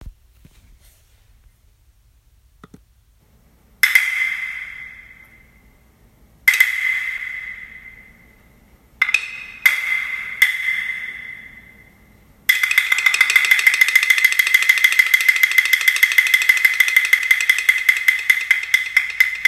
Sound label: bangu drum